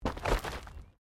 Body movement Impact Roll Gravel

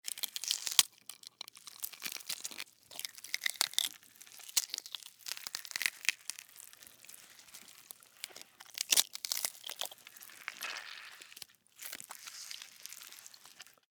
Scifi, Alien, Egg, Hatch, Wet, Slime, Crack; Alien Sound Effects

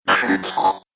Robot Talk Droid

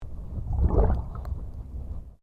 Underwater Movement Swoosh; Submerged impacts created by dropping a weighted rope into the ocean; Splash Sound Effects

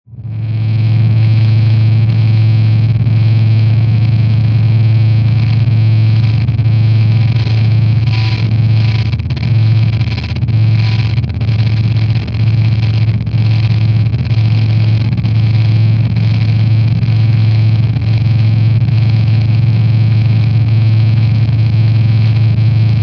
Drone Dark Stutter Distortion; Blastwave

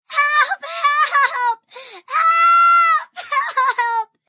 Help Crying, Female; VoiceBox

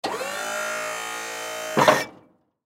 Snow Plow, Snow Plow Hydraulic; Industry

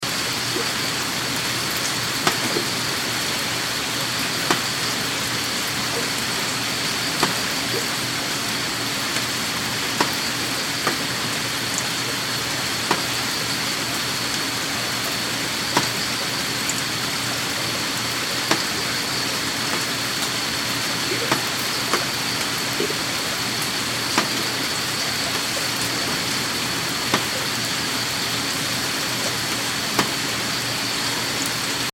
Rain Drips Crickets Tokyo Japan; Medium rain, Tokyo, Japan. Raindrops fall onto surface, some large drops falling heav; Rain Sound Effects

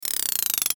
Rollover Descending Clicks; Mouseover Fast Airy Whoosh Scrape; Multimedia; Blastwave

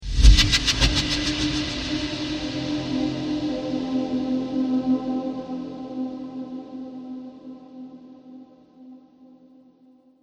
Sci fi Mystery Trailer Hit

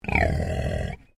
Animal Pig Landrace Growl; Alice; Animals from Edgar's Mission Farm Victoria; Pig Sound Effects; Pigs (Sound Effects:Animals); Foundation1

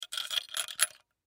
Rat Trap Handle, Rat Trap Handle Movement; Mousetrap

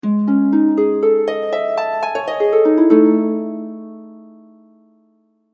Harp Of Mystery; Musical Sound Effects; audio logo, web background, video game music

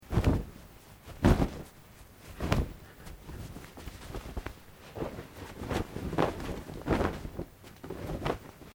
Cloth Cotton Shake Sequence; Material components for constructing more complex sounds; Dressing Sound Effects

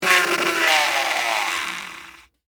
Bicycle Tire Spin, Slow, Down, With, Card; Texture; Spinning; BlastWave

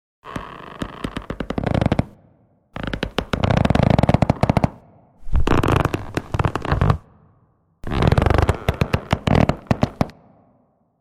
Creaking wood; Objects

